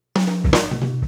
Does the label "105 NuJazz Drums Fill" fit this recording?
Yes